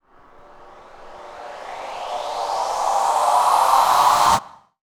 FX Uplifter; Mutekki Media; Vengeance Electroshock Vol.2

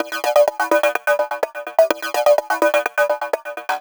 126 C SYNTH ARP; funky; Loopmasters; Funky Underground Vol 2

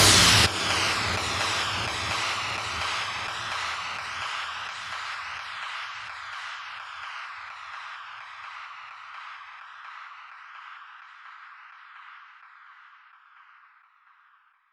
FX Impact; Mutekki Media; Vengeance Electroshock Vol.2

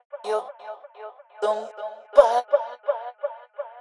126 AB VOCAL LOOP, Funky Underground; Loopmasters; Funky Underground Vol 2

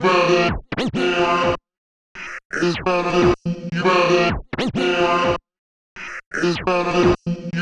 126 F VOCAL LOOP, Funky Underground; Loopmasters; Funky Underground Vol 2

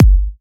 Bassdrum

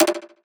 Percussive; Mutekki Media; Vengeance Electroshock Vol.2; VES2 Percussion

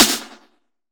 Snare, Dubstep One-Shot Drums

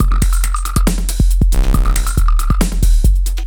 Bpm138 Break, dubstep drum loops; Radio Fish

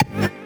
G STAB, funky

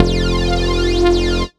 Bass Shot F

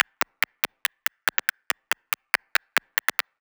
141 bpm Perc Loop, Dubstep

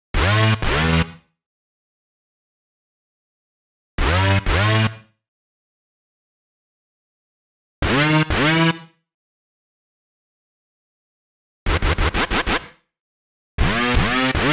125 Am Synths, Complex Electro Synths; Prime Loops; Ratio

